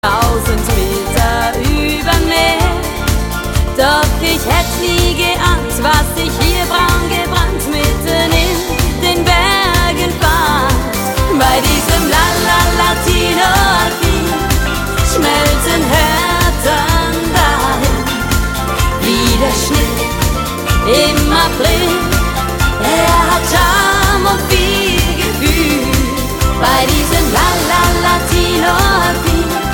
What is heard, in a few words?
Latino Alpin